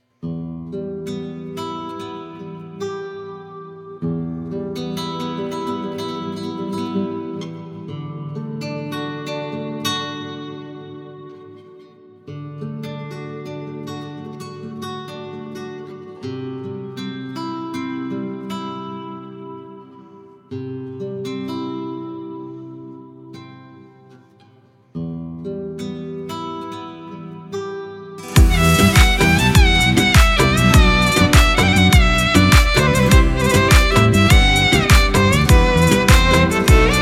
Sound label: Bi Kalam With Guitar